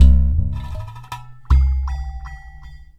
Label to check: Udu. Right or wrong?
Right